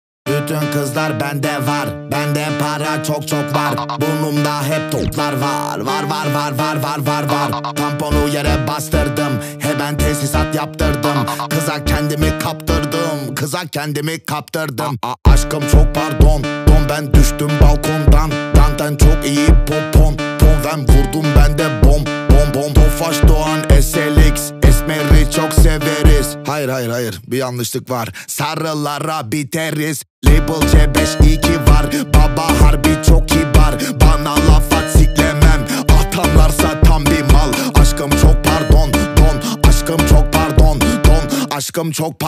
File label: music